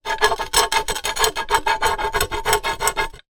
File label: glass thick large piece sharp edge scrape on other; zapsplat